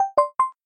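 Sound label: level up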